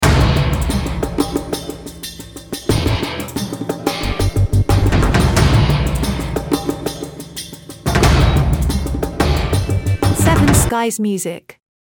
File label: Action Drums Teaser, Loop